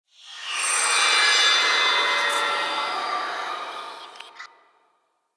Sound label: UI Ancestor Discovered